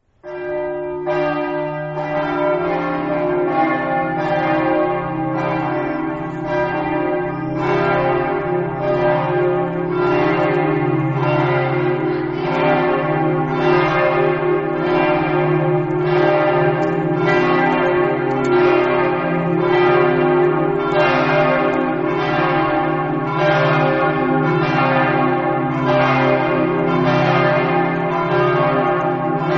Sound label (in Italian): cividale, campane